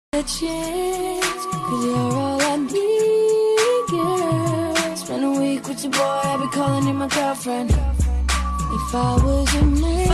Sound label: english song ringtone